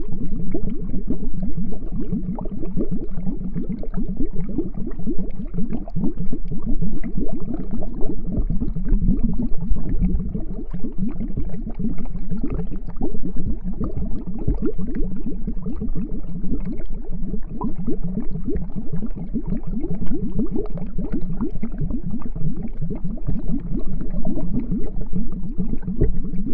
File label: Cauldron; MAD Alchemist Lab